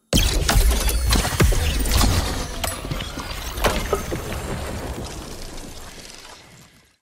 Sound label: large attack; cryoclasm